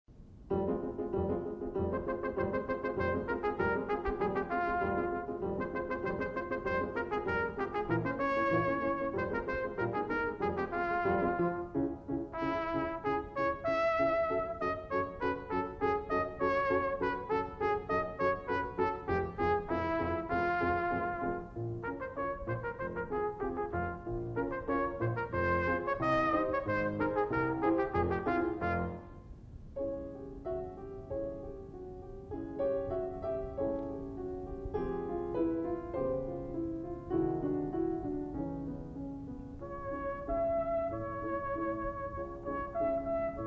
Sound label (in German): Trompetensonate